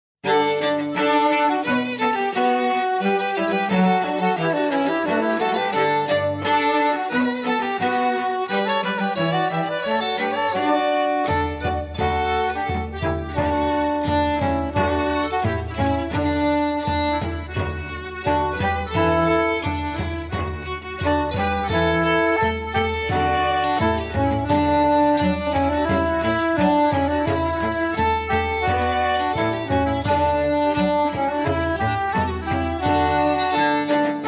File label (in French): le ramoneur instrumental